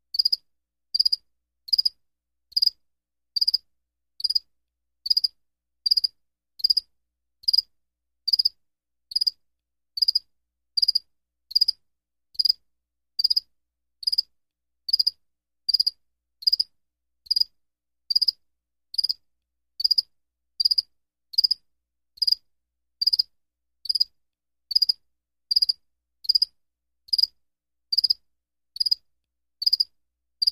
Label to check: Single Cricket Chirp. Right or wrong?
Right